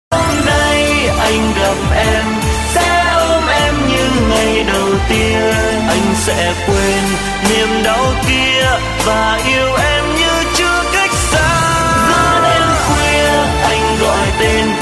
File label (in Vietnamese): nhac chuong